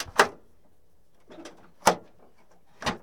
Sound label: Plane P40 Lever Carb Release; Airplanes